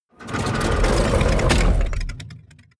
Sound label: tank medium damaged